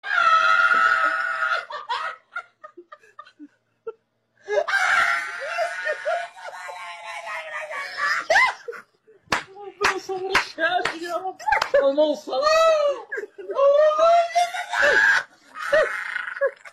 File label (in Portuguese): risada hahaha